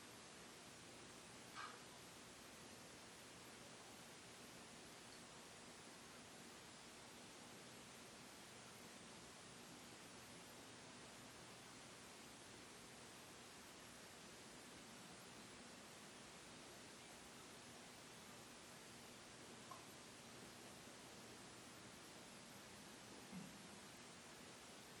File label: Inside Tattoo Shop